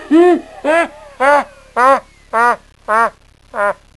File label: slow laugh